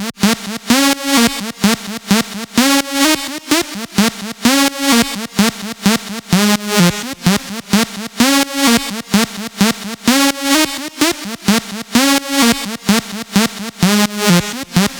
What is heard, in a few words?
128BPM Lead Loops Sidechain - A#; MELBOURNE DROP VOL. 4